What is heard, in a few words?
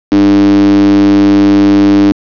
test announce qpsk 275hz; rspektrum